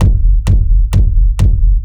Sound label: kicktest zerolatency, kick sound design